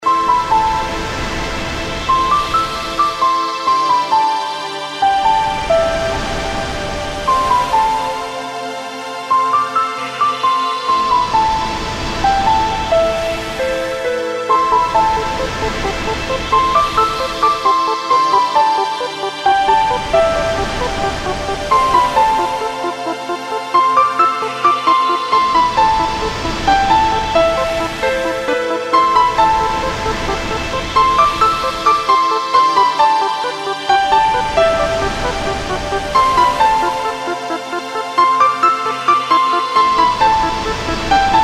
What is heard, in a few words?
Beach Trance